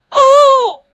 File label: a shocking middle aged pe, shock